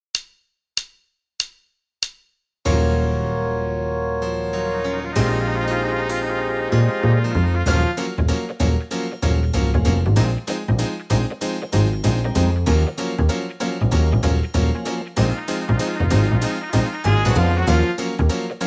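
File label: Backing contemporary R&B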